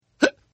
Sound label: Hiccup; Human; Hiccup 1; Hiccuping; Hiccupping